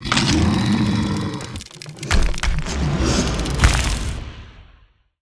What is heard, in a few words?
lancer death, monster